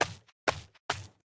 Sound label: idle scratch; smite; kuzenbo